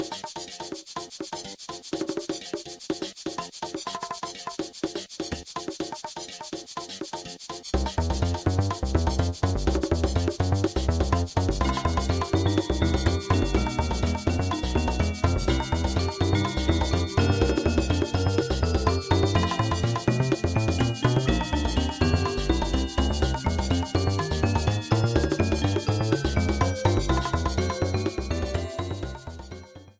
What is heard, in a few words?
Theme; SMS